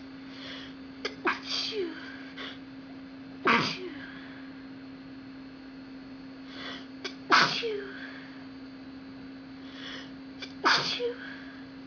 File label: sneezy, female